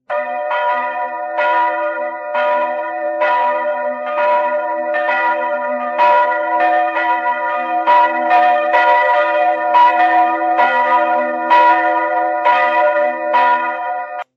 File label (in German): Glocken Kreuzkirche und Frauenkirche